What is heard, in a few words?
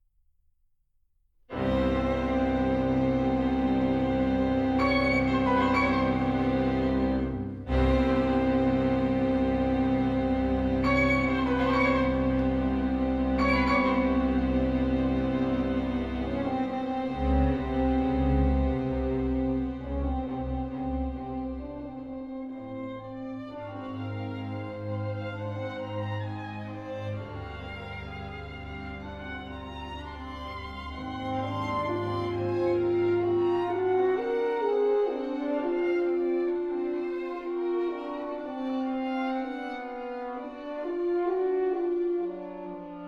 maestoso, orchestra